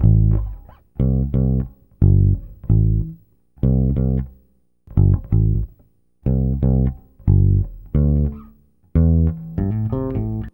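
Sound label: bass, A minor, Hip Hop & RnB; Saturday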